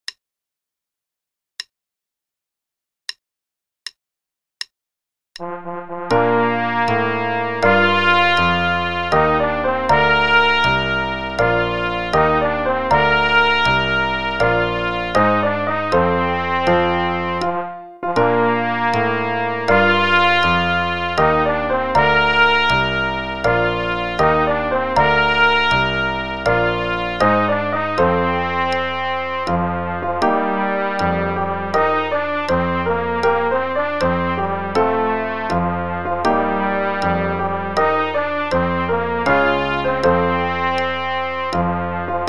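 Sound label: with piano, quarter note=80; Play-a-long; trumpet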